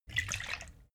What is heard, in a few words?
bottle vodka upend; berufe